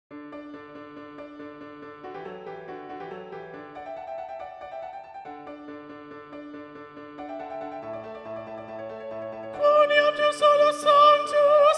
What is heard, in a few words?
Soprano Predominant; Gloria - Vivaldi; Quoniam tu solus sanctus (Gloria)